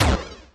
boss hit; horizontalrunner

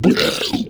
boss lite sloppy roar

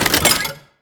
coin insert

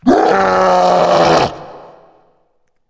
war dashing

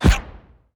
level up; bumbo

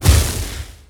beast lava ball rise

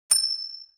spawn; wooden nickel

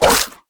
whip

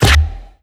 maggot pop out; pestilence

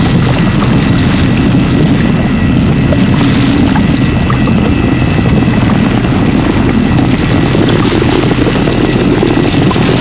blood laser medium loop

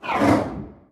death horse whoosh

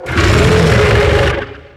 beast vocal grumble

